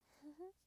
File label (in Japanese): 笑い声; ループボイス